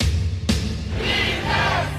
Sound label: Defanse loop; 战中; 战斗音效; nba